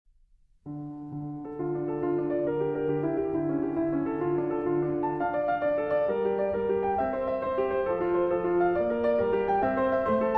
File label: Prelude in D Minor